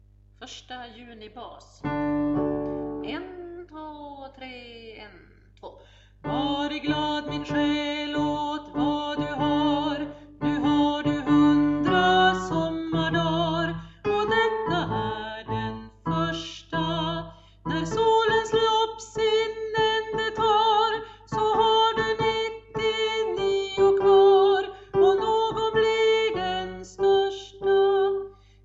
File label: bas